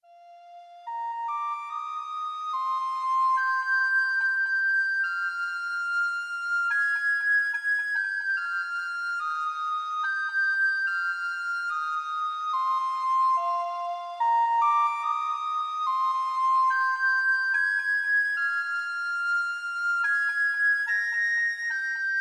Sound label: recorder song